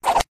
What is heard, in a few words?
spider jump; Minecraft; Votive Content